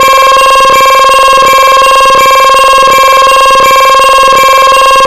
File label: Missile Lock Loop